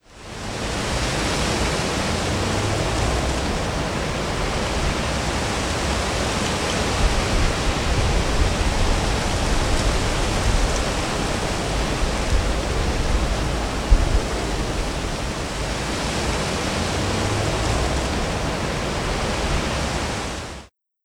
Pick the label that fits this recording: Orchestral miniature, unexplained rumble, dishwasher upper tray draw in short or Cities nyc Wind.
Cities nyc Wind